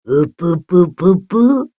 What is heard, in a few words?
upupupu; monokuma